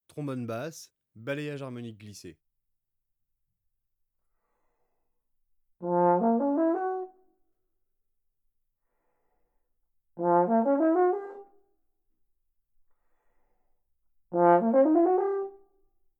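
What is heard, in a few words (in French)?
basse balayages harmoniques glissés, trombone; Effets; traite orchestration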